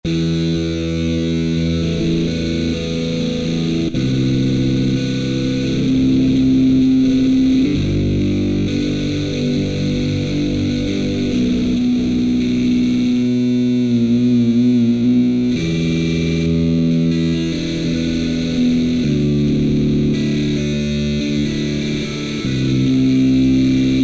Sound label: Yamaha TG500 GT dist2; Internal Voice I